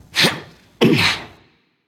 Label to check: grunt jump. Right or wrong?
Right